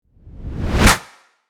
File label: Cinematic rise and clap